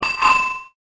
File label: Bike Bell